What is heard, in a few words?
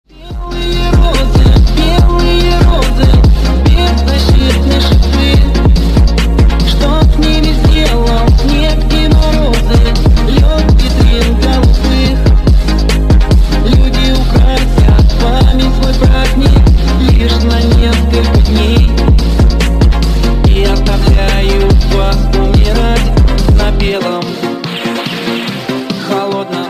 phonk remix